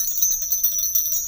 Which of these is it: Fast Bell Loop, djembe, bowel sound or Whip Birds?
Fast Bell Loop